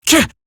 pain, female; Warframe; Eximus Grineer Female; Battle Barks

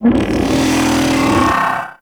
Arachnoid Camper Step Movement; Warframe; Corpus

